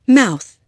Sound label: MOUTH